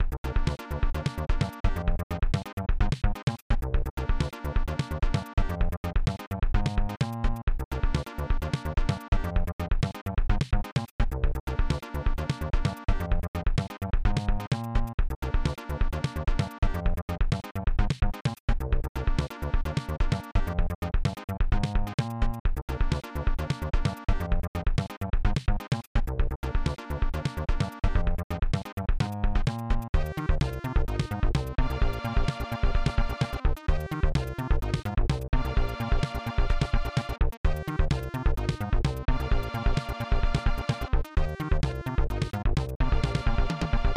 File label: Level Music